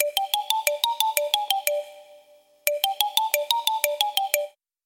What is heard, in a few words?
Blimpee